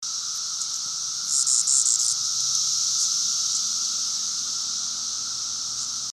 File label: cicada creak; Cicadas creaking